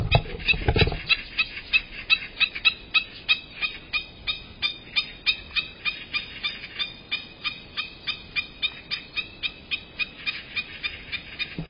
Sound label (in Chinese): 高蹺鴴〔黑翅長腳鷸〕 Himantopus himantopus